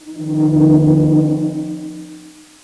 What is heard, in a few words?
groan